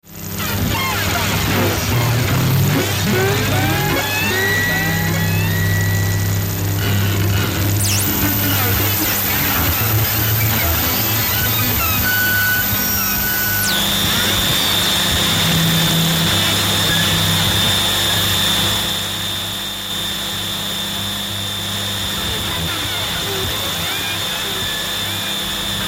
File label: Blues, noise session